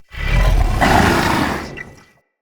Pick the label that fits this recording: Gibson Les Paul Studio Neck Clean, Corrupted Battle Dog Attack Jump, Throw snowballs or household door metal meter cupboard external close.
Corrupted Battle Dog Attack Jump